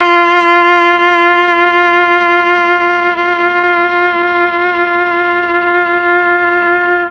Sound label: F3 VIOLA, Mellotron; Mike Pinder - Mellotron Archive CD